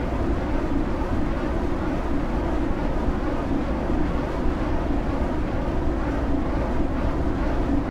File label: wind powerplant; Euro Truck; mover